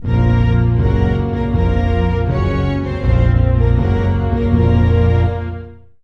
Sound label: Music Funeral March; SFX Kit; Foley - Misc